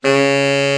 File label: BRA TENOR, SAX; Best Service Gigapack 1; BR SAX 4